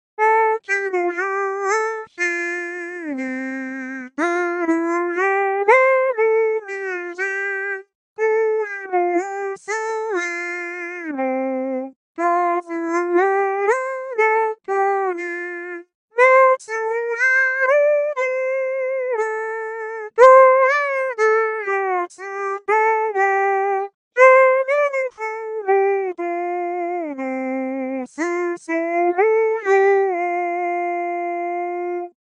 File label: Guitar